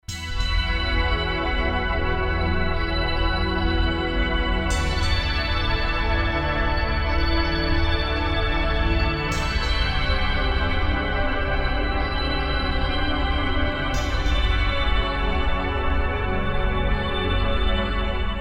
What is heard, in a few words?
pad digital, Yamaha TG500; Pad: morning pad